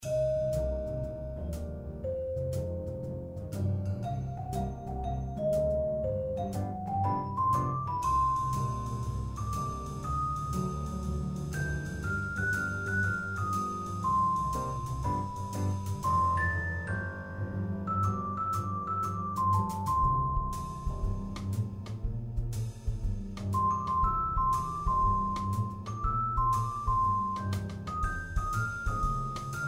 Celesta Jazz

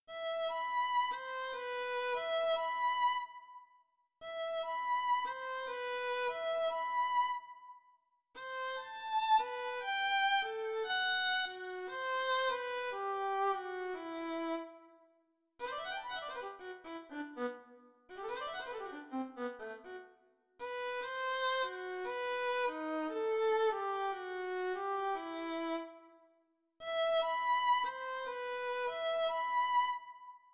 Soliloquy for Violin

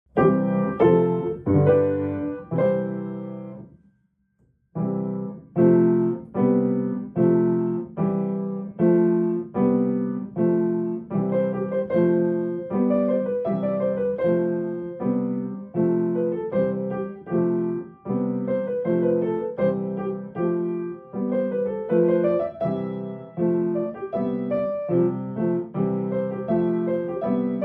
Improv, improvisation for piano

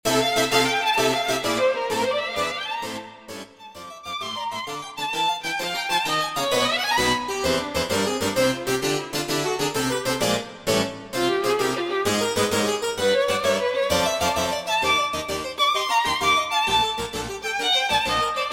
Gothic Tarantella, for violin and clavichord

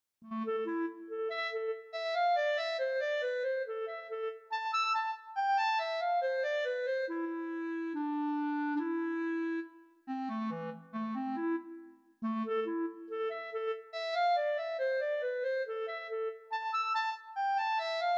Soliloquy for Clarinet

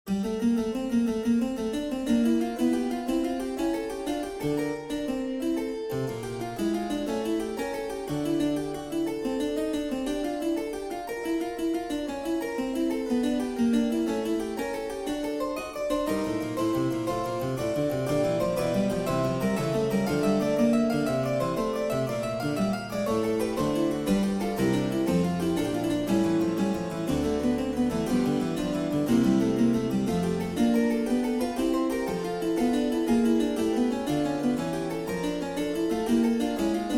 fugue in g minor

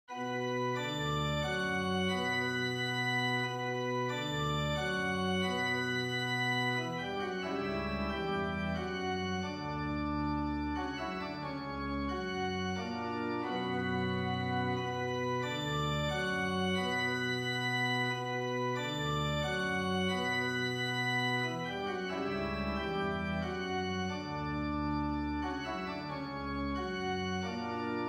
Soliloquy for Organ; no 7

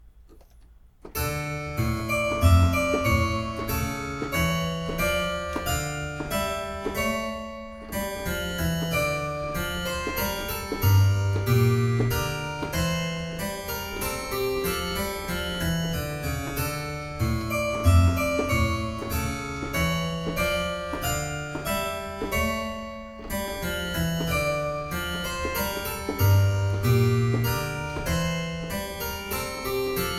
Menuet in D minor

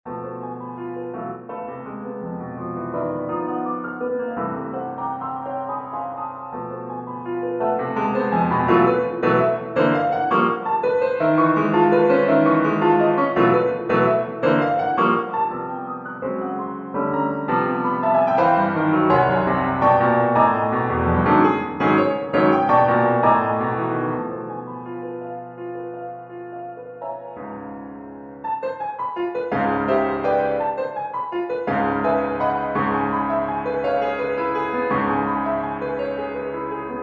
Melody for Piano; Melody for Piano Winter Op 28

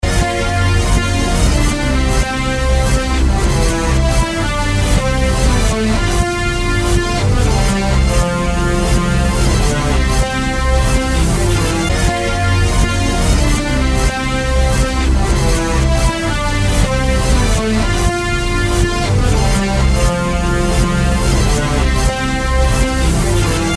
REVERSED; guessing game №2

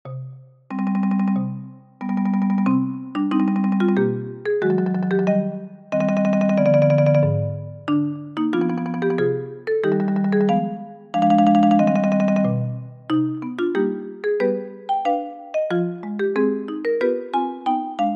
A Little Poem for Marimba Trio

